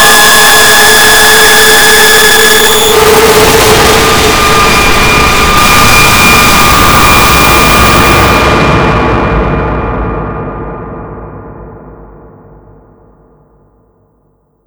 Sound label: sonar mega ouch; casualtiesunknown